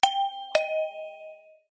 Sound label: Shopping Pingpong